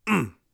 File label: hit, Warrior Voice; Warrior Voice Pack